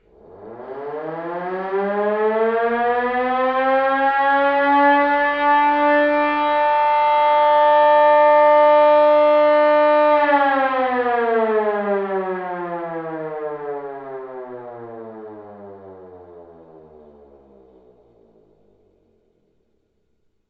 Siren Low Long

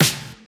Vinyl Snare

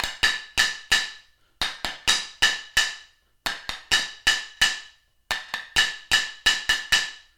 Improv Percs 2 Bars 130 Bpm

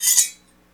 Dagger Drawn

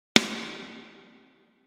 Snare

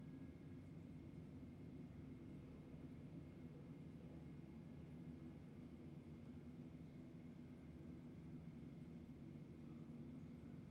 Hallway

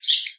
Single Bird Chirp

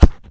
Kick

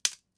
Twig Snap Classic

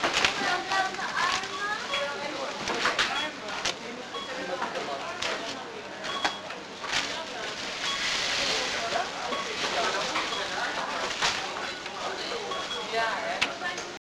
Supermarket

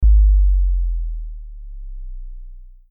Sub G Sharp; Oceano

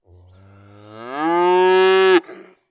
Moo Moo Moo The Cow

